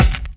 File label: KICK